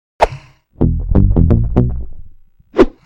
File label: Important Item; Sly Cooper 2 Band of Thieves